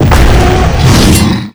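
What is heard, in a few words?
spawn, monster; sekhmet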